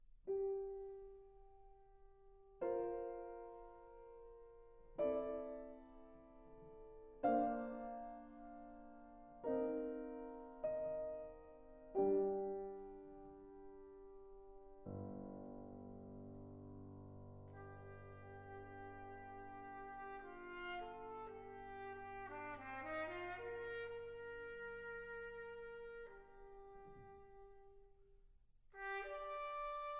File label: Sonata For Trumpet & Piano - Mvt. 2. Rather Slowly & With Freedom